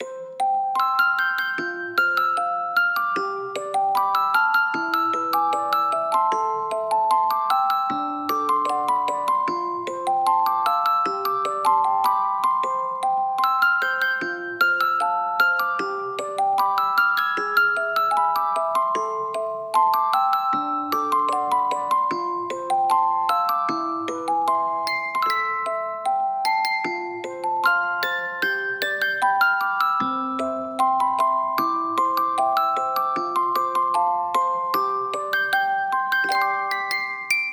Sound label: music box; Little Princess's Serenade Original Soundtrack